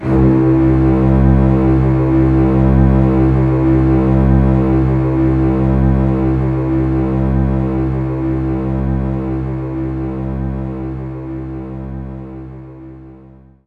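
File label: String Loop C1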